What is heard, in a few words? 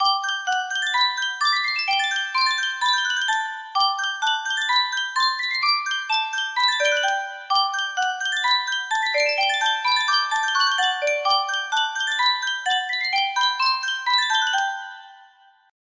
custom tune music box; gold saucer